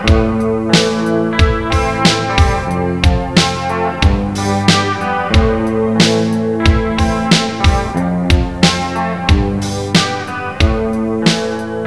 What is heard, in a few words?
slow